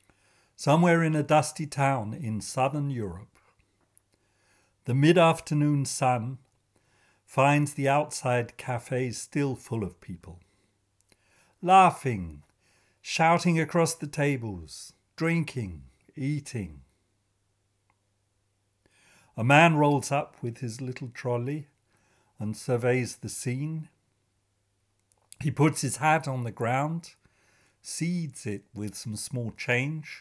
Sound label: blues